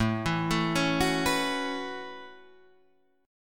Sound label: A 6th Add 9th A down Guitar Open D